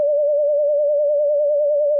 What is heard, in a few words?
D5 tremolo